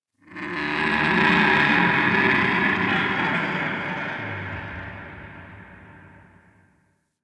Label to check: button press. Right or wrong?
Wrong